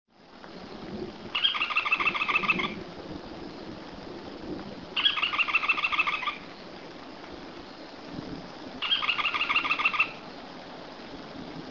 Automolus leucophthalmus Barranqueiro-de-olho-branco